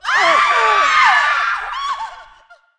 person panic

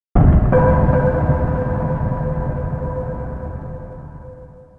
Dead Sector, ambience

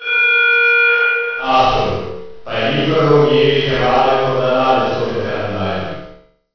speaker

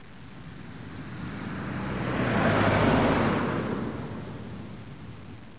car

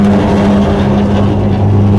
cube train move

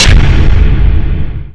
present health

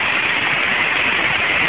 radio static

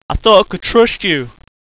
hurt

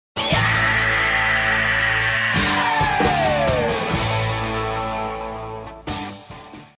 yeaaa

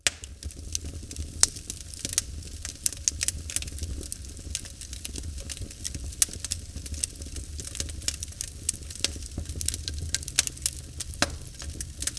fire

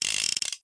357 spin

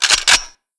gun pickup

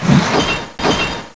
miss

